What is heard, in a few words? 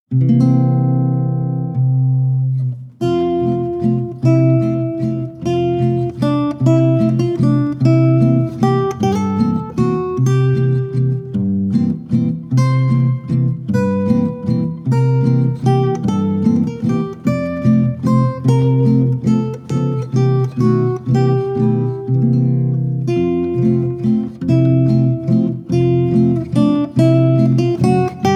Peaceful Guitar, Lullaby